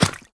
scuff, footsteps, concrete flooded

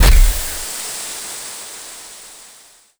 controls steam; combine plug

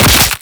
host abandon pop, headcrab reviver; creatures

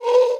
move, hinges drawers; tictac

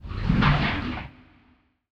water lap; ambient; Counter Strike Model Pack; CS:GO; nuke

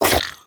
breath out, headcrab black; creatures

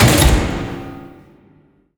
vent crash open, grate; Half Life Alyx

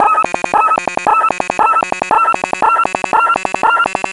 manhack stunned; npc; Half Life Alyx

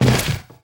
fs fm plywood, footsteps; Portal 2

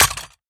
weapon smg impact; physics

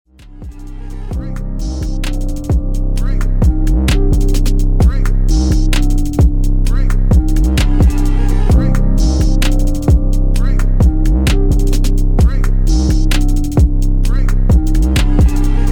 bomb planted

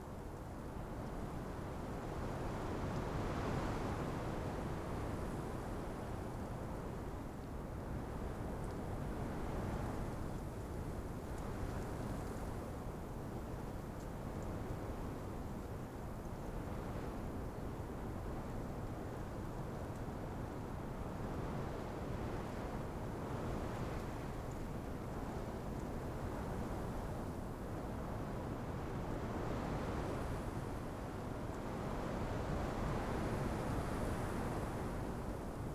wind sand; ambient; Counter Strike Model Pack; CS:GO; dust2

